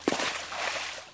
FS Stream, singles water

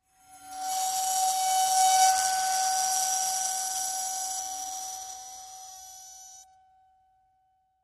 Vibrate Bowl, bowed metal

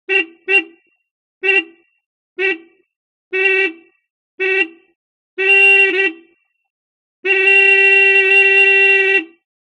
Horn Austin Truck Cir

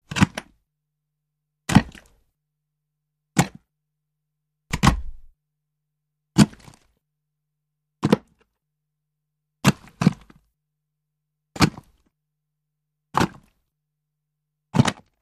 Body Impaled, gore stabs

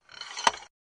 Tripod Leg Telescop

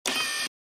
Camera Servo Motor, servos

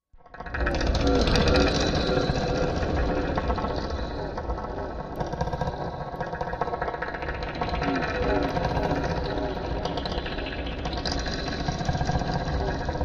Hollow Heart Med Voice, eerie ambience

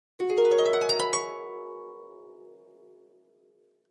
Harp High Strings 7th

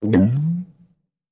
Gigantic Monster G

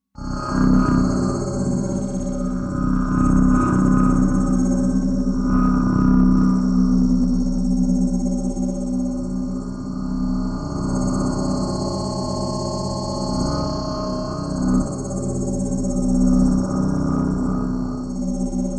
Scanner Low Space Scann; scanners

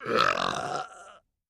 Med Character Belc; belching

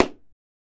Gavel Med Hit; gavels

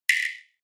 Flash Gear Metallic

